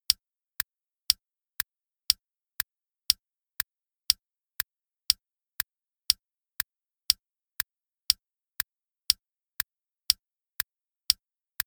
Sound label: clock tick